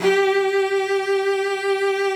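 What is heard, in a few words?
cello vibrato, G4; Xsample VOL-7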